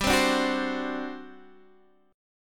G Major 7th Suspended 4th, G

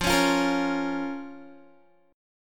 F Suspended 4th Sharp 5th F, Guitar; Fsus4#5; strummed; 13,16,15,15,13,x

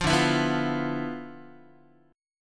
D Minor 6th Add 9th down Guitar; Dm6add9 strummed; JGuitar

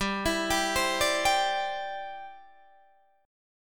C-Major Add 9th G, Guitar Half Step Down

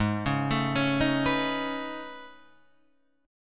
A Major Sharp 11th, Ab

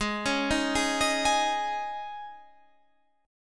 G sharp Suspended 4th G sharp, Guitar Standard; G#sus4 arpeggiated; JGuitar

